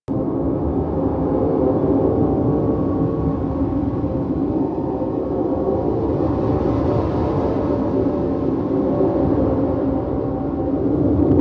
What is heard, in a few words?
Railjack Cockpit Back Room Ambience; Warframe